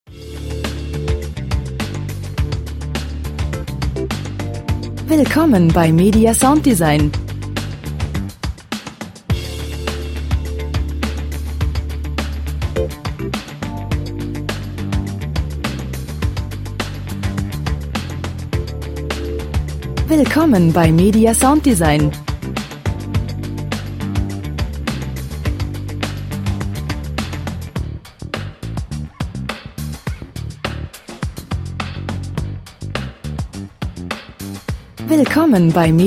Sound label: percussion groove